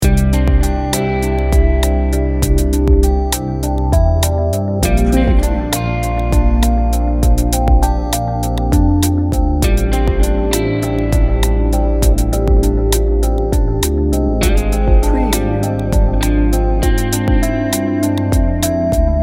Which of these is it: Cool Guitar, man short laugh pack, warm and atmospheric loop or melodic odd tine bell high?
warm and atmospheric loop